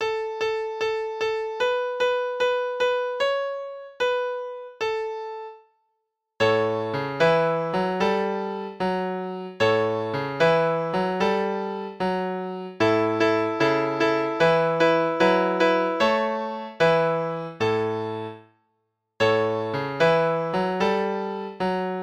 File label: blues hot cross buns on A